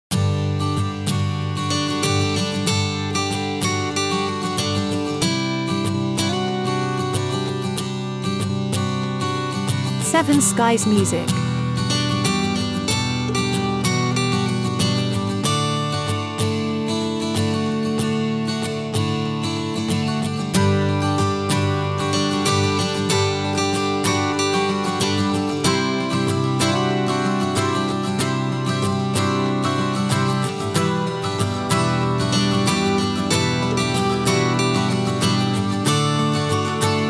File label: Acoustic Summertime